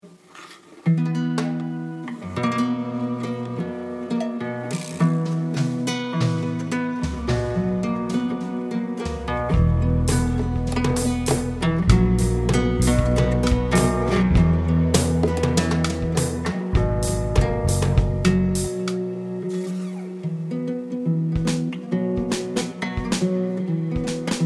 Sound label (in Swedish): lägre drums